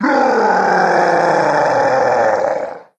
Bowser Roar; Brothership

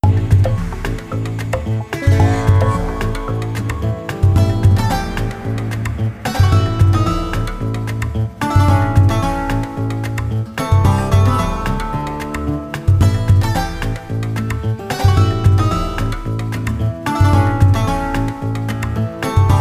Desert Theme; SM3DL

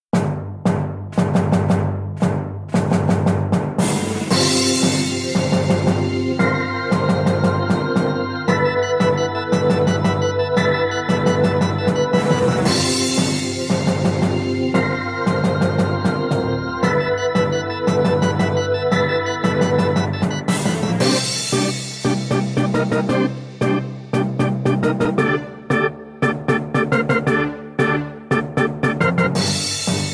Airship Theme; SMR

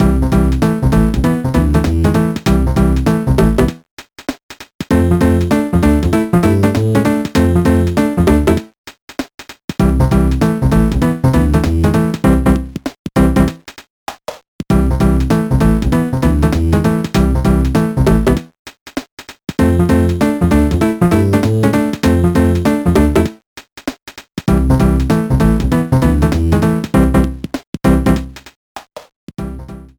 World 4 Map; SMA4; World 1 Map

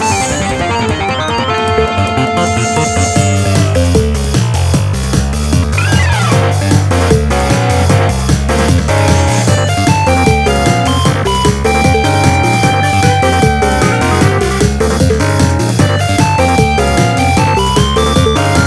Frontrunning; 3DS; Wario Shipyard; Mario Kart 7

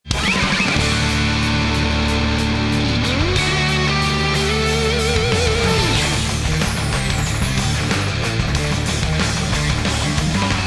Volcano BGM Arrangement

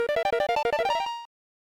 Bonus Room Opened!; DKC SNES

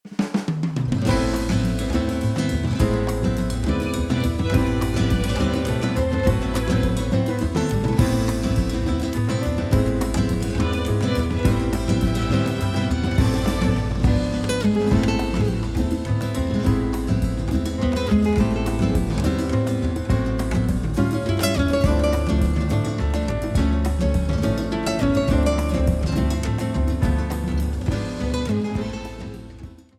Underground BGM Acoustic Arrangement; SMB

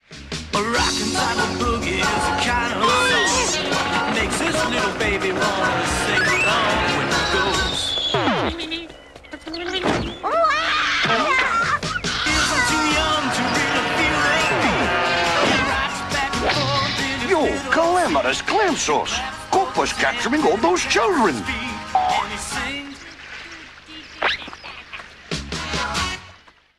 Short music sample of a cover song

